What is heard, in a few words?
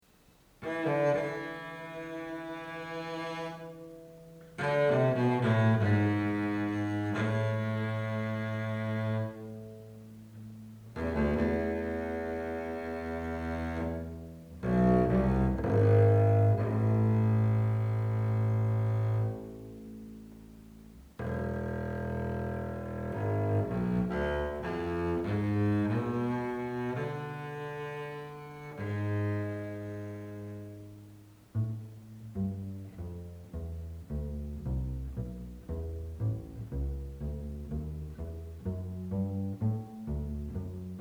bass